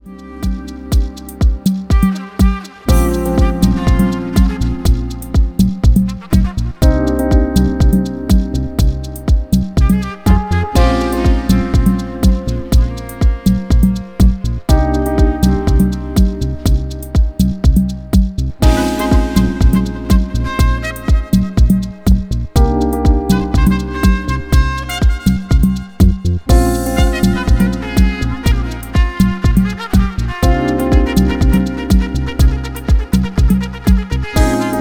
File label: Instrumental